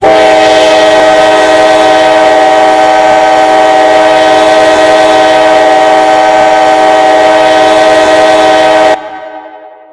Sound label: k5la, horn; run 8 v3 horn pack; csx